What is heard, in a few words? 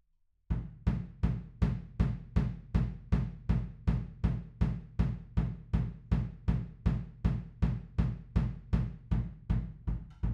Room, 160 BPM